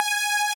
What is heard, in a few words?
lead o6gp